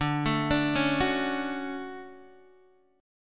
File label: D Major 7th, D, down, Guitar DADGAD